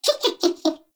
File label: baby vocal